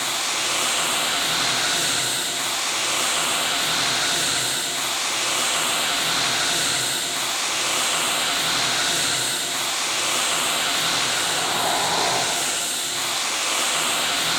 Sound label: Health Loop; Void Bastards